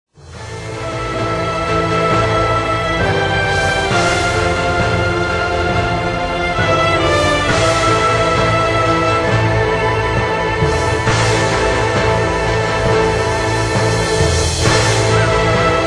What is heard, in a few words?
sad music